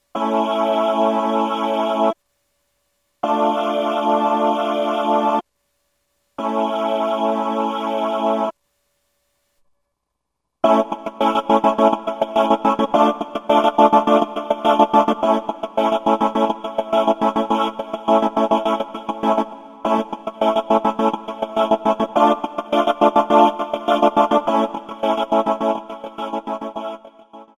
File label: STACCATO GATE, Korg Wavestation A-D